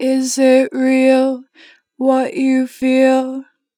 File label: Natural Vibrato, Vocals, Is it real, 2 bar vib; Harmor Vocals